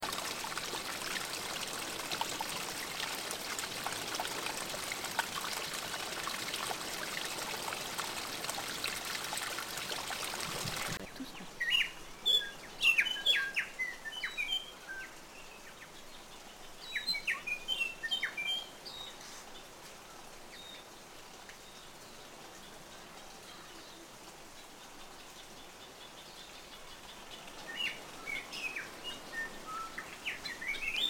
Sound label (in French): bruit oiseaux